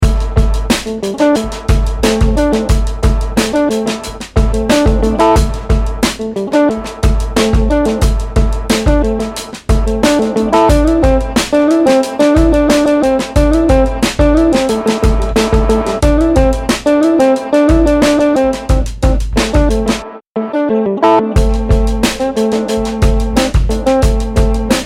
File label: Dope Guitar; TRX; Construction Kit